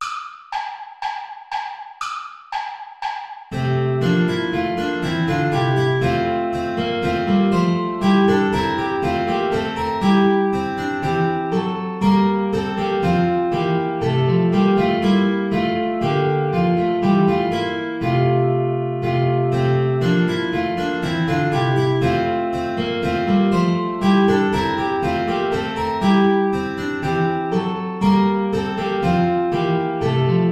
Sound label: play along without guitar; praetorius michael bourra